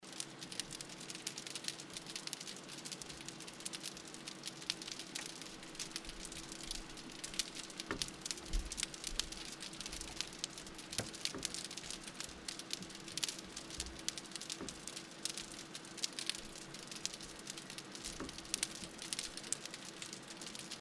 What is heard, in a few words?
snap shrimp, rumore subacqueo; acque marino costiere e lagunari